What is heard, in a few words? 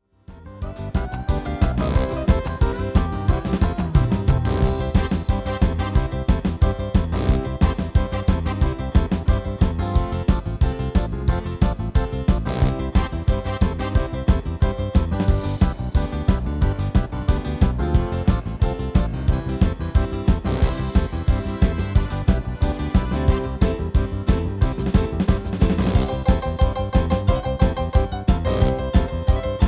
MIDI